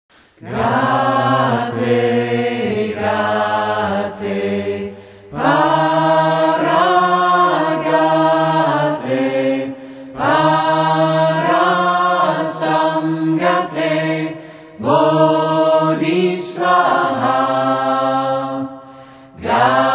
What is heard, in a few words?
Gayatri Mantra, Gate